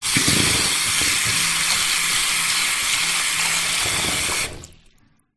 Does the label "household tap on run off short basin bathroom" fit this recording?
Yes